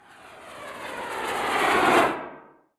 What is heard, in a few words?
net falling